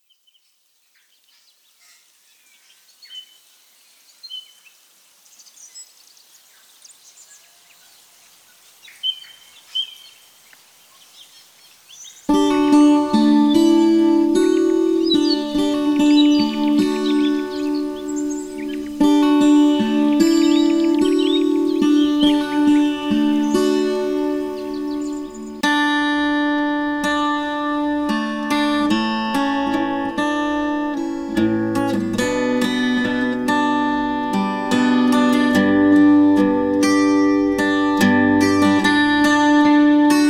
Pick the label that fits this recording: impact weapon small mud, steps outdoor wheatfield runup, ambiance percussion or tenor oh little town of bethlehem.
ambiance percussion